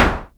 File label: SYNCUSSION